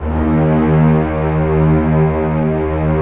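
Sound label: cellos, forte, orchestra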